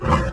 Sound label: step; lmctf